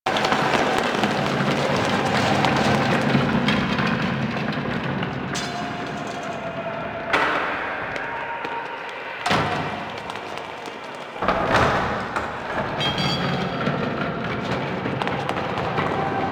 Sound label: Town Commotion Loop; Warframe; Duviri